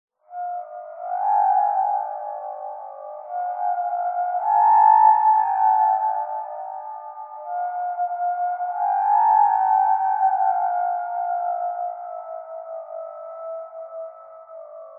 science fiction horn weird alien distant musical horn blow; zapsplat